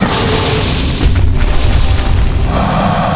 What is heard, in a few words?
Mob die; Monster; 패왕릉; 봉군도위